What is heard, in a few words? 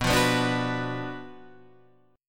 Gb Major 7th Suspended 4th Sharp 5th